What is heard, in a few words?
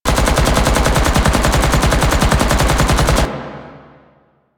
Turret Loop; Weapons; APC; Warframe; Lasrian